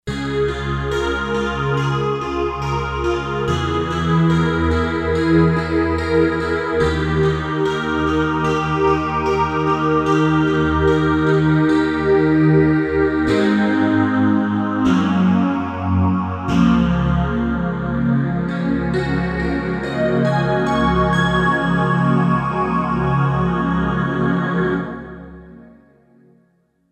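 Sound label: Pulse Phaser Pad; Roland SC-880; Factory Patches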